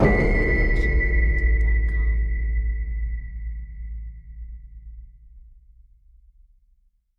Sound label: cinematic hit metallic pin drop; soundscrate